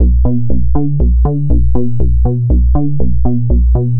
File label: Moody Bass, Bass Foundations; Sonic Foundry (Sony Creative Software); The Electronic Music Manuscript Collection